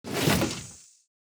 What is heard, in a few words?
panda close; battle